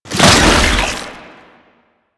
Proto Infested Babau Underground Attack Tentacle Emerge, Enemies